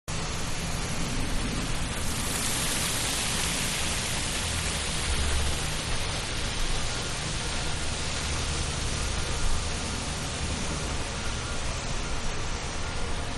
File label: Sewage Treatment Outside City, Sewage Treatment Plant-Outside, City In Background; Manhattan Production Music